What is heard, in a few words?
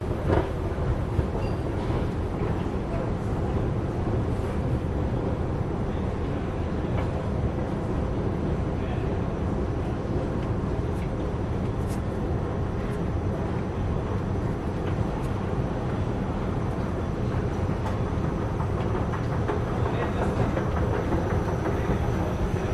Metrostation Escalatorsound; Budapest Hungary; your free sounds